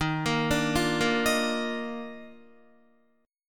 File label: Eb Major 7th Suspended 2nd Eb Guitar 4E 4A 5D 5G 5C 6F; EbM7sus2; arpeggiated; JGuitar